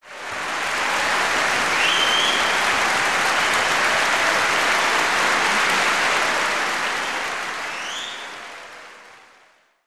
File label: applause; garfield futbol